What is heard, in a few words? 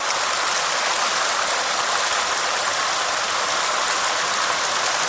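Waterfall Small Loop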